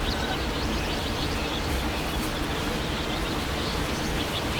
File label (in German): Bachrauschen mit Vogelzwitschern; Filsquelle